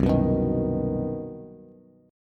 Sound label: C Minor Major 7th Flat 5th C down Bass; strummed; JGuitar